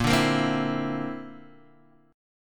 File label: D 7th Suspended 2nd Sharp 5th A sharp